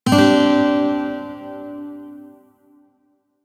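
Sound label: Shawzin Pentatonic Major Chord L, Music; DE; Warframe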